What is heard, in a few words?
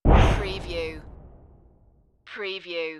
Wipe